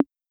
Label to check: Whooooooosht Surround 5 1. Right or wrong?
Wrong